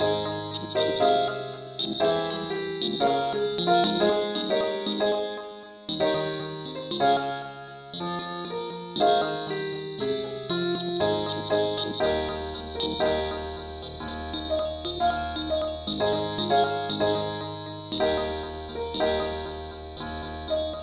ukelele key aes

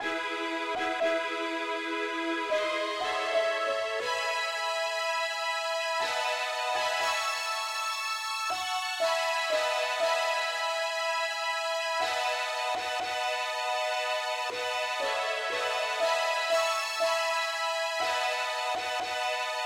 mandolin Lead key e

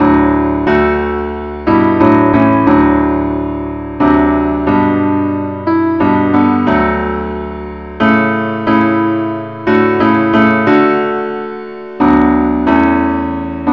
parts only key d

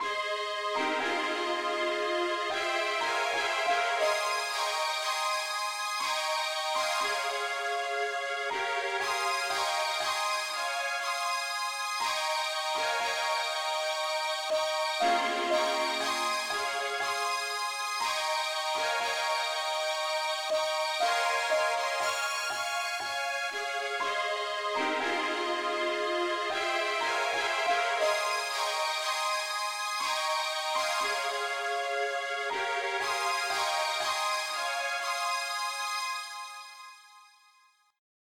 popukelead key aes

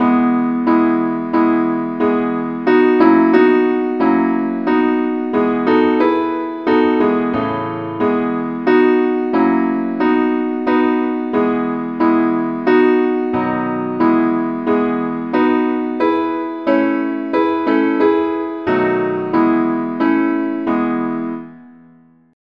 simple acc, key E

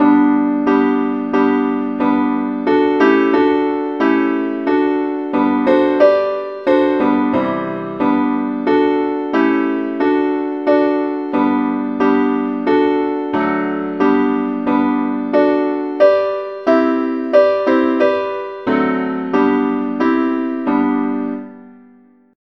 simple acc

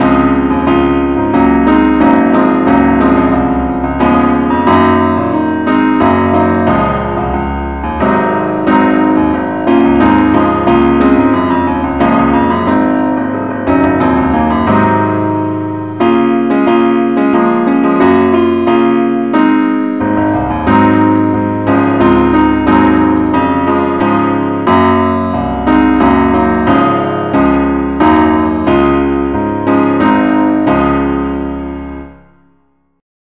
ineedthee keyboard only key des

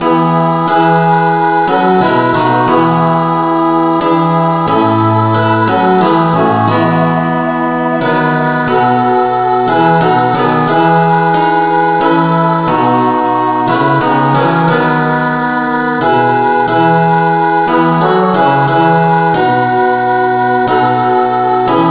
no vocal key e

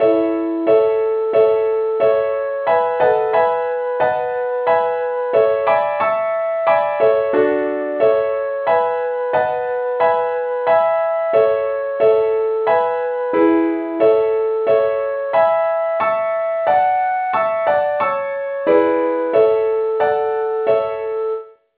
leadsheet key a

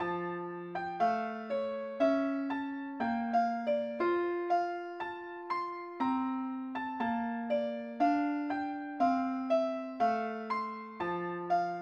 mandolin, key f; pilot

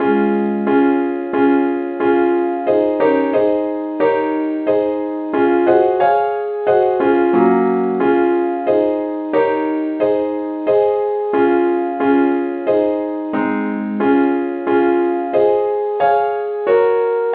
leadsheet key des

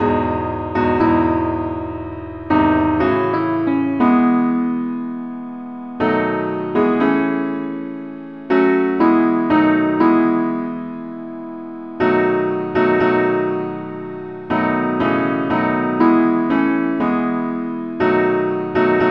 simple acc key e

